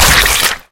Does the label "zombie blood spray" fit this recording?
Yes